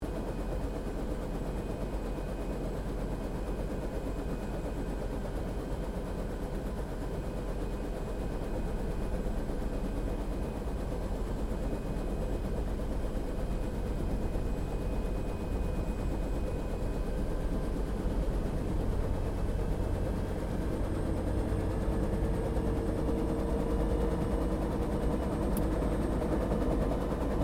HELICOPTER 1967 BELL UH1 IROQUIOS TAKEOFF INTERIOR; RAN UH-1 Iroguios; Helicopters (Sound Effects:Vehicles)